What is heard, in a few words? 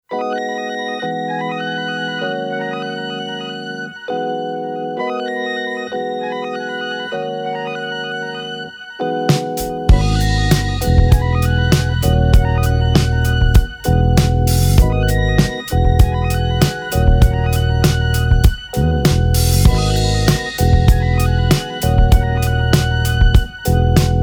Mysterious Vibes; Soul, Hip Hop, Jazz, Disco